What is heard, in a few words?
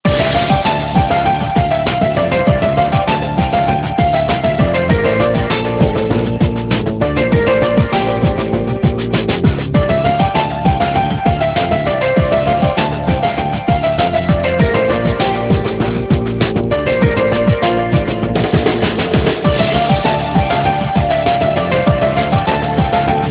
ringtone newage